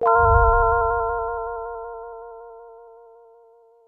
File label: SYNTH Pure Tones Chordz